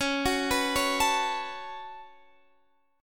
C sharp 7th Suspended 4th Sharp 5th, Guitar, DADGAD; down; chord shape